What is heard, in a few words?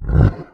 NPC Killed